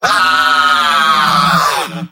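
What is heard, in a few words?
engineer pain critical death; tf2; mvm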